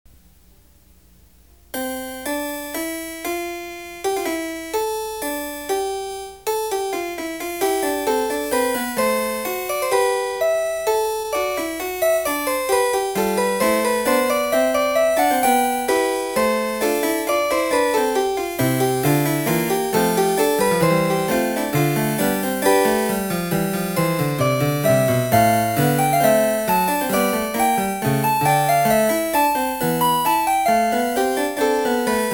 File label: bach wtc C fugue